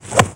WOOD; Computer Buyer 1997 August; Jack

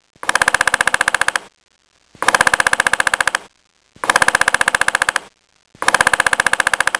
Woodpecker

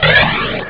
Turn Over; Crystal Caliburn

